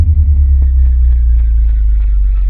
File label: Bass Guitar Dropping Pitch